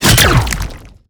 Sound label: Hand Bomber Proj Fire; Warframe; ManInTheWall